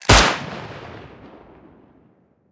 revolver attack; Rust

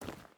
footstep boots forest walk; Rust

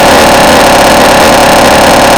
Weapons M39 Rounds; DCS

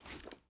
mp5 deploy; Rust

